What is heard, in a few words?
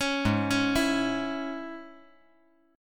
Ab Suspended 4th Sharp 5th, chord; arpeggiated; JGuitar